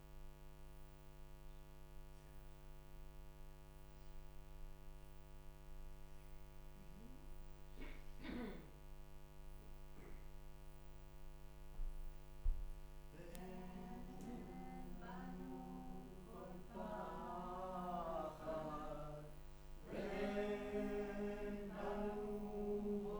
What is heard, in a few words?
Room, Choir